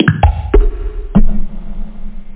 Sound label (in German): Klock; Taifun 090; Instruments